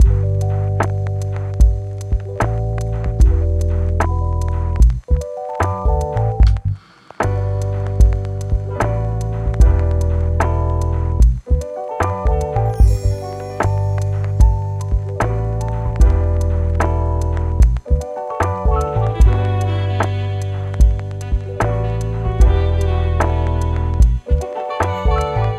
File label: lo-fi